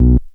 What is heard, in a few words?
tone